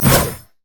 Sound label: infuse; LoL; soraka